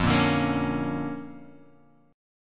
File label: D Minor Major 7th Sharp 5th F, guitar